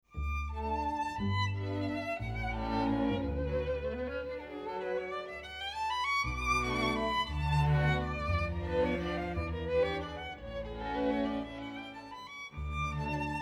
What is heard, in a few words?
valse